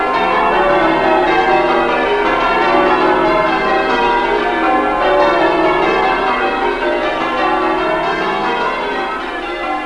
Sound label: Canterbury Old bells